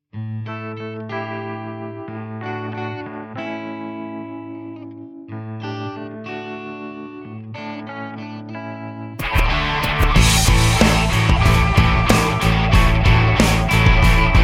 (A To B) No Backing Vocals

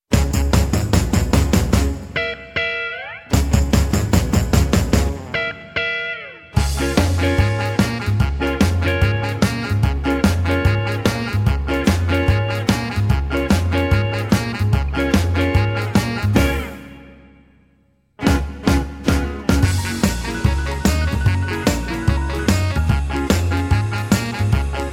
(Db To D) No Backing Vocals

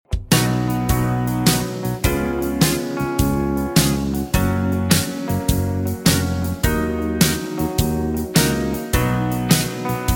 Gb No Backing Vocals, backing tracks

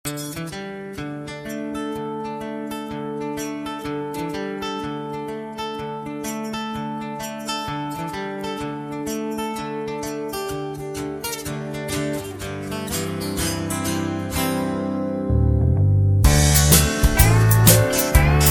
G No Backing Vocals, backing tracks

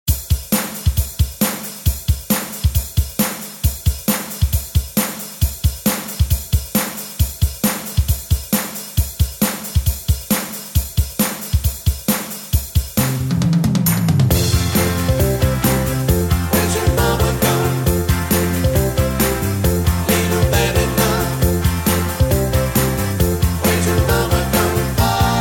F To Gb, backing tracks

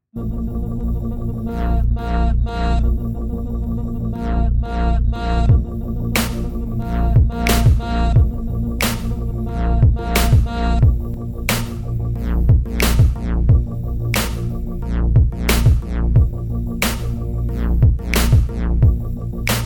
(Bb) No Lead Guitar

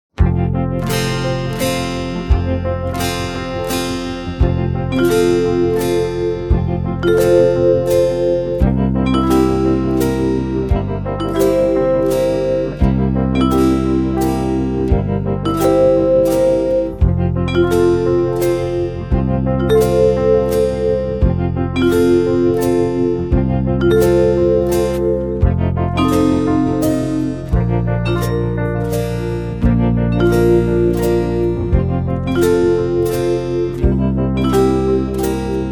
(F) No Backing Vocals

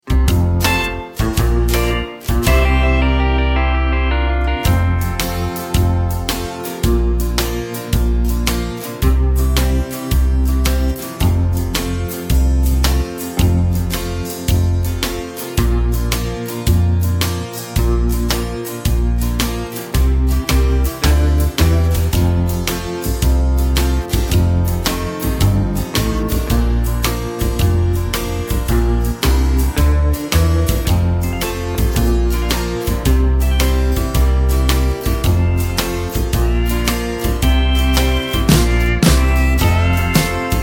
F No Backing Vocals, backing tracks

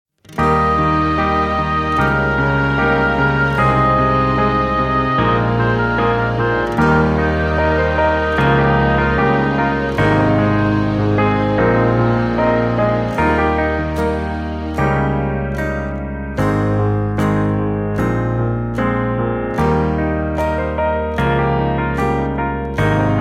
(Eb) No Backing Vocals

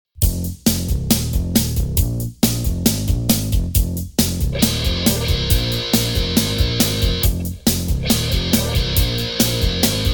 F No Backing Vocals, backing tracks

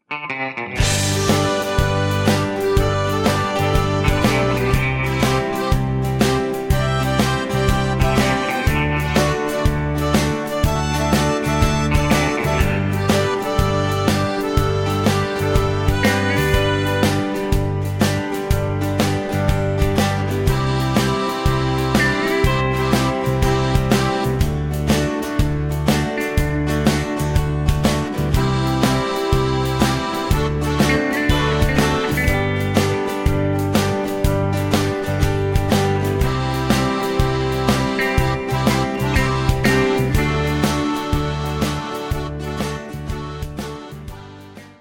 G No Backing Vocals, backing tracks

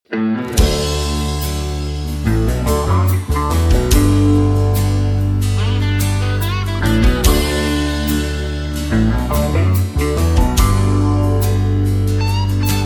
D No Backing Vocals, backing tracks

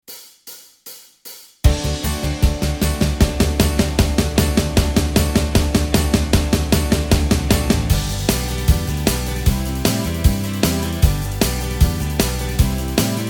(G) No Lead Guitar

